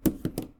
Smudge drop; Phasmophobia

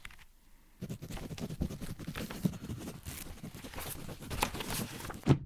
Ghost writing sound; Phasmophobia

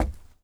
FOOTSTEP Trainers Wood Boards Walk mono; Phasmophobia

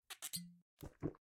Meds drink; Phasmophobia

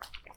Wet footstep; Phasmophobia

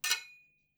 metal pipe; Phasmophobia

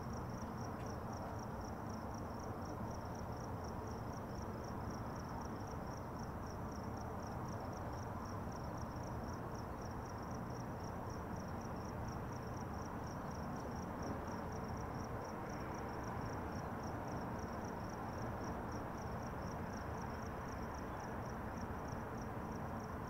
Nights Ambience Neighborhood; Phasmophobia

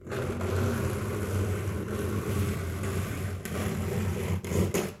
Crucifix Turn; Phasmophobia

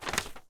Page turn; Phasmophobia

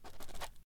Journal Writing Sound; Phasmophobia

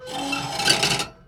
Beartrap open; Phasmophobia

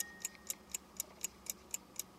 Alarm clock loop; Phasmophobia

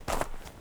footstep trainers snow compact walk fast; Phasmophobia

